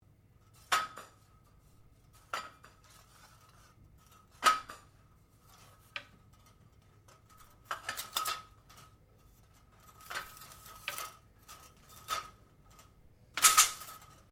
Blinds Venetian Movement, Household blinds; Living Room

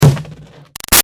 Impact Green Garbage Pail Hard; Gritty Scrape; Plastic; Materials

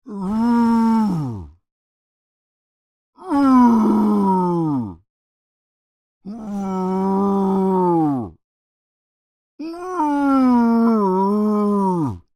Creature Moan Protest Series, Human; Groaning; Voice; BigRoomSound

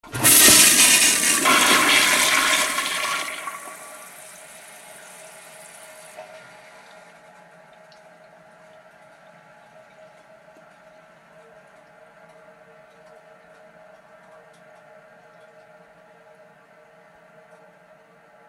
Yacht Toilet Flush Marine Bathroom Lid Up; Transportation Watercraft; Boat; Boat Sound Effects; Blastwave

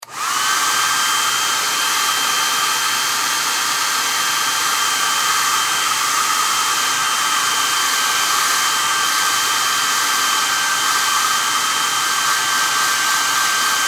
Household Hair Dryer On Run Off; Bathroom Sound Effects; Bathroom (Sound Effects:Household); SFX Bible 6

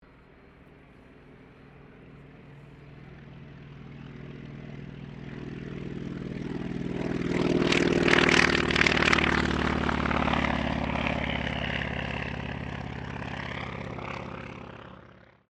AIRCRAFT CA-28 CERES TAKEOFF; Airplanes; Vehicles

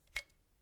RIFLE CZ 452 VARMINT 22 CALIBRE MAGAZINE RELEASE; A selection of domesttic firearms; Rifles

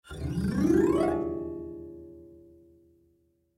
Cartoon Autoharp Magical Ascend Musical; Cartoons; Blastwave

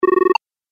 Collect Chiptune, video game

